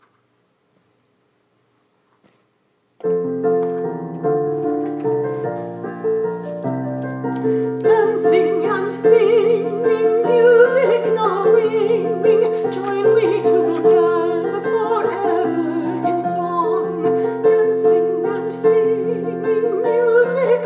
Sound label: Dancing and Singing Alto